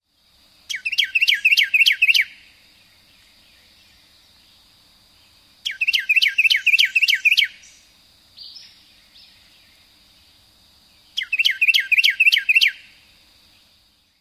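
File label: Carolina Wren song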